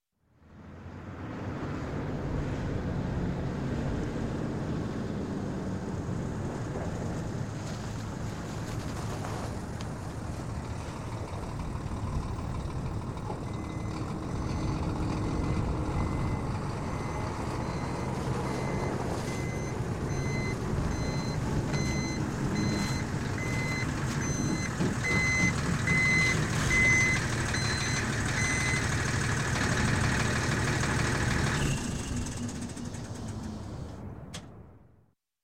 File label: Diesel Truck, Pull In, Backing Up Shut Off; Sound Ideas 2000 Series